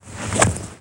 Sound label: ROUGH; Jack